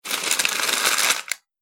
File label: Parcel Wrapping Paper Scrunchy Noise; Foley; Andrew Fleming